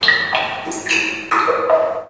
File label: drop, underground; ambient